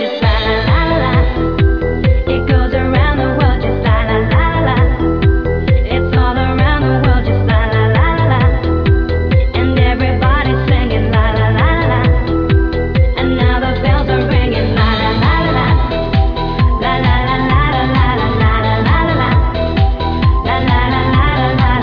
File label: worldmusic; cs crimetown